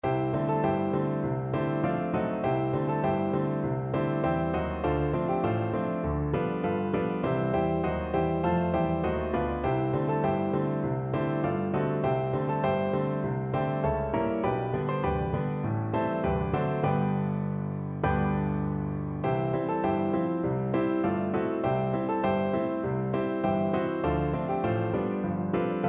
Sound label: quatre mains piano, piano duet